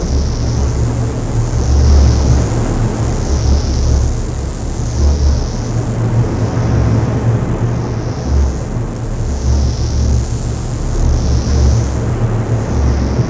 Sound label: fusion reactor; factorio